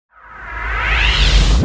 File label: Sweep, Fx, Sweeps, Trance; Zero-G Trance Inducer